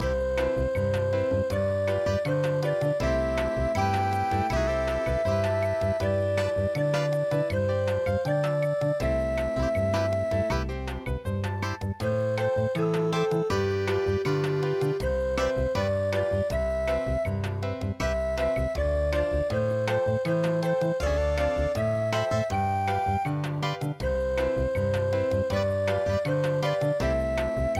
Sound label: bossa; Oshogatsu